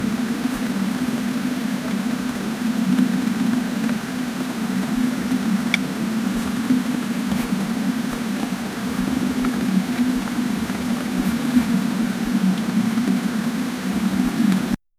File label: the sound of scrolling th, interfaces